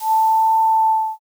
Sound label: flute